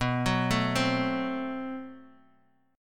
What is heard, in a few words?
A-Minor 6th B, chord; Am6/B arpeggiated; JGuitar